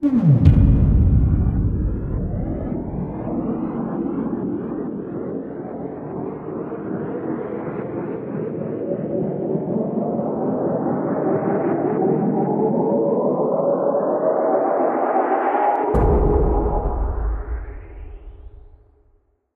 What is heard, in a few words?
breaker room bootup